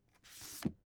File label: foley book take shelve; smartsoundfx